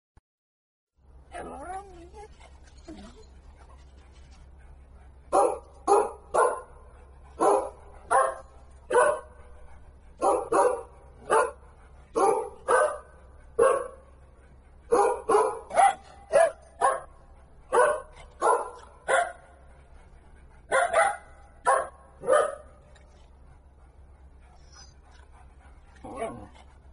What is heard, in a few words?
Happy Birthday To You ! Dog Bark Sound ! Bgm